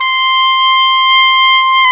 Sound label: oboe, Roland Orchestral Winds